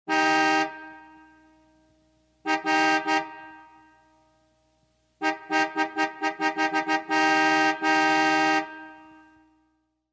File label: Car Horn; SOUND EFFECTS1